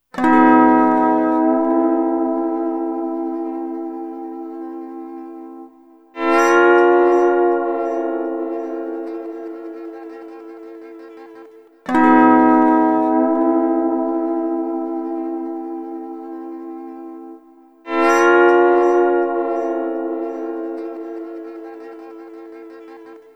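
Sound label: Sunrise Guitar Chord E, Lounge; Zero-G Kocktail Kollection; Construction Kitz (Lounge); Sunrise Mixes 082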